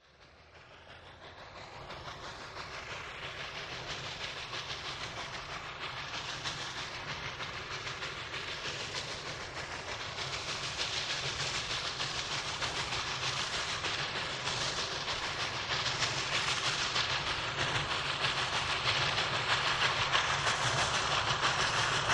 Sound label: Steam Train To Stop, steam train